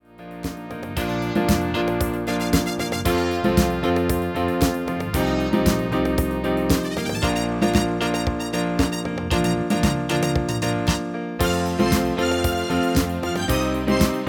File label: MIDI